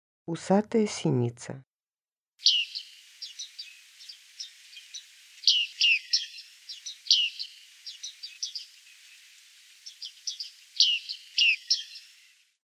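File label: bearded reedling voice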